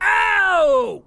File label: owwwww; eustace